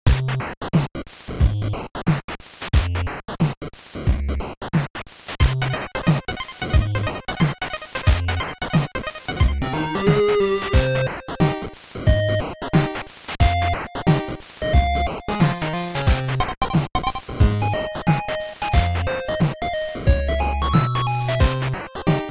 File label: BGM Shop